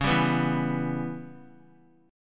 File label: C sharp Minor 7th Flat 5th; C#m7b5 strummed; JGuitar